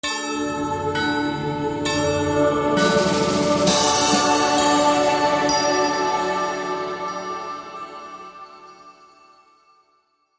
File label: Light Sting